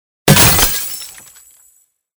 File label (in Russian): Televizor razbili